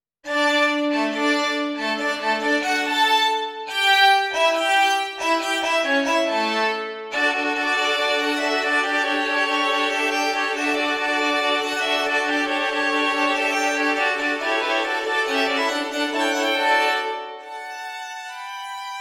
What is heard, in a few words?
Violin Quartet; Allegro from Eine Kleine Nachtmusik